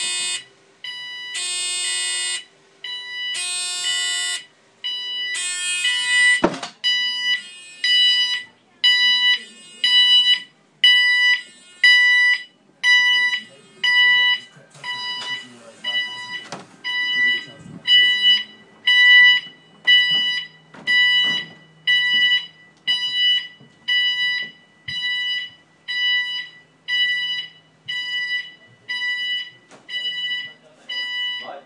fire alarm; the many sides of fire alarm a journey into annoying sound

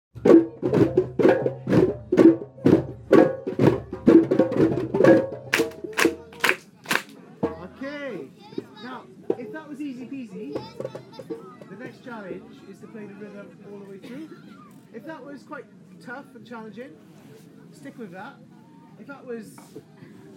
drumming lesson

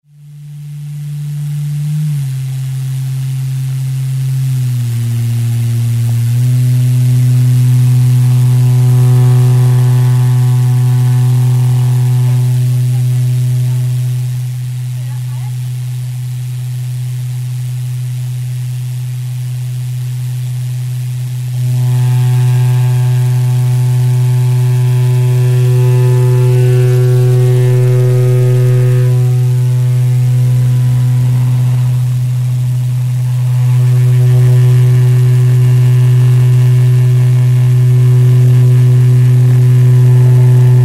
vibration test

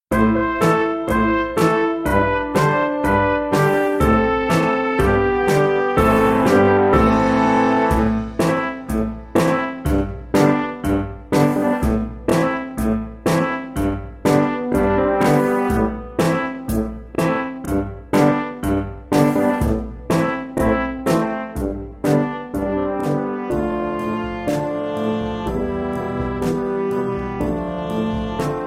hornpipe; lord henry's

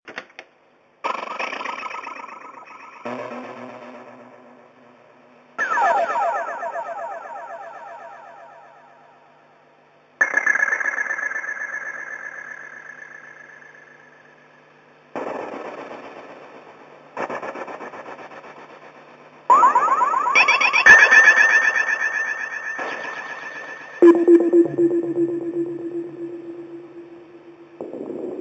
moon loops electronic music; work in progress for apollo 11 electronic music event

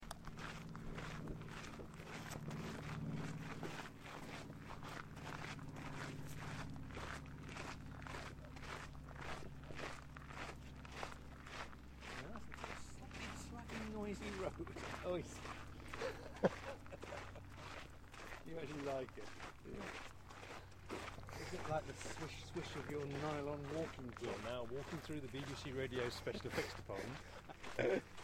a noisy path

